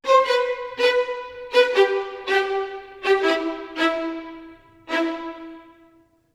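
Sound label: 80 strings mid, Orchestral arrangements, A minor; PowerFX - Downtown Orchestra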